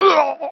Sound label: high damage death